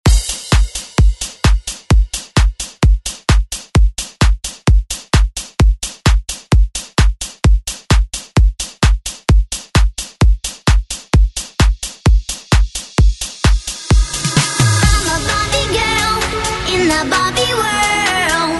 Remix Re Drum; DJ Mhark

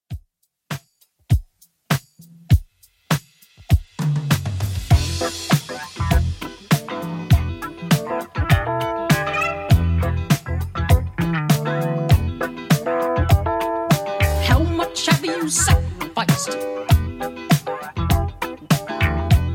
70s Redrum 100bpm

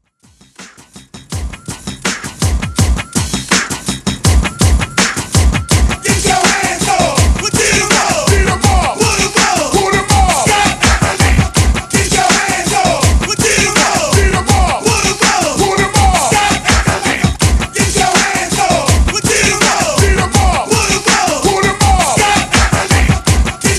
Party Intro 82Bpm

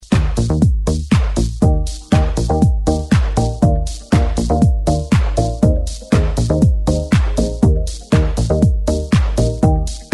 90s Dance Intro 120 Bpm; DJDOLLS

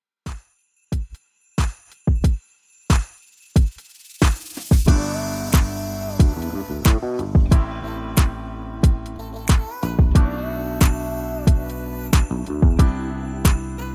91Bpm; Redrum

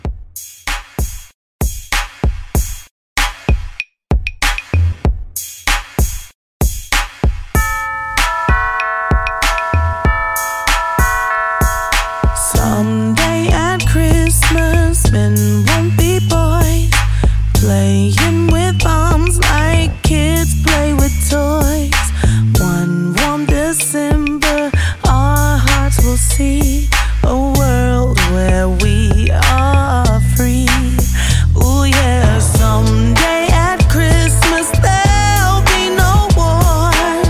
Re Drum 96; 2024 Holiday

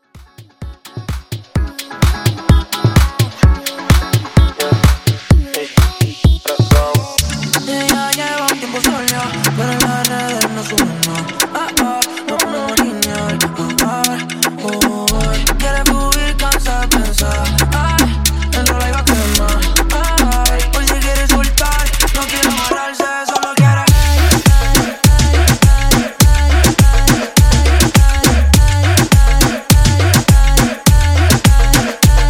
Funk 128 97 Bpm

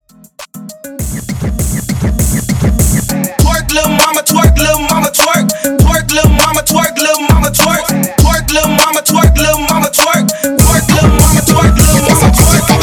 Hype Twerk Edit 100 BPM

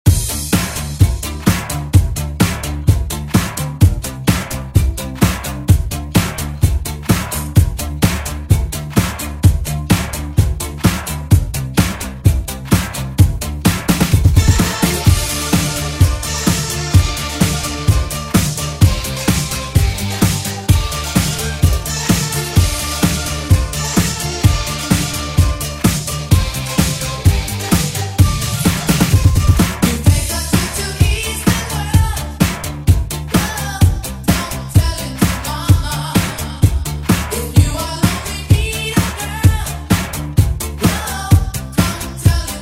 Oldschool Redrum; Deejay Hit

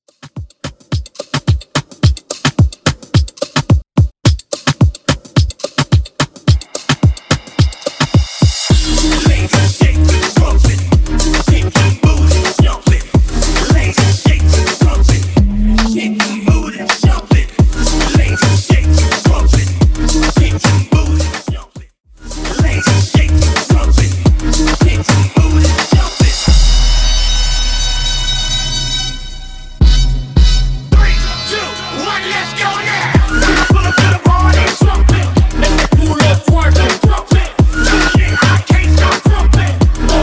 Moobahton 108bpm

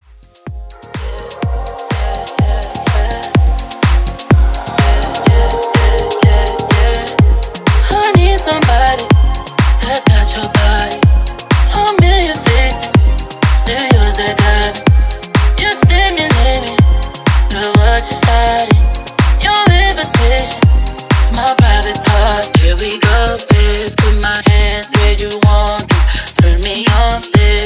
Club Dance Re Drum 125 BPM

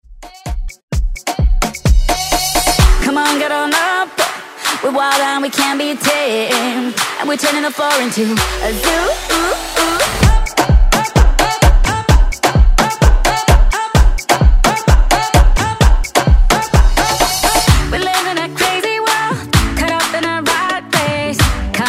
Afrobeat Redrum

